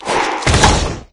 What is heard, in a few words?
attack novoc, maggot; doom3